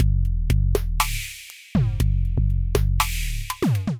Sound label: Meaty Kit, drums synthesized; Ableton; Factory Packs; Drum Essentials